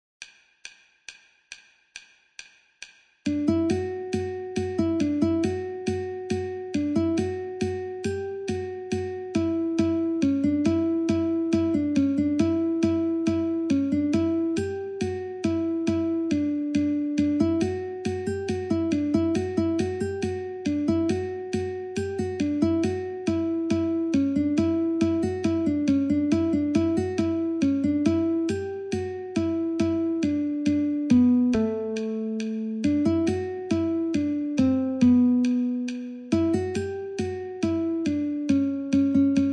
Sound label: Guitar